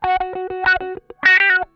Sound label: OUCH, Funk Guitar; Zero G - Funk Guitar